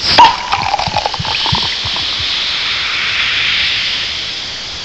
coke pouring; coca cola kid

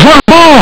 dropped ball

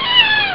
miaow; neko dai suki

